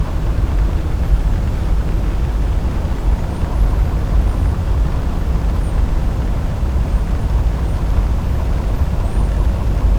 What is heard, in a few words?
Whistles 96kHz